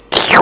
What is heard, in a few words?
eat; DinningPhilosophers